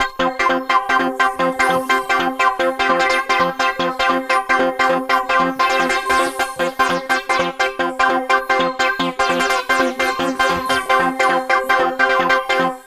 grunge clavi, 150; Masterbits - Tekkno Trax; SQ LOOP 150

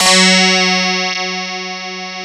DS8 F#3, Korg; Back In Time Records; Korg Universe VOL-1

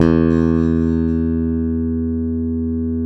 SPEC SUSTAIN, Bass

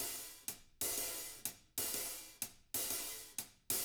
hihat loops; Living Drums

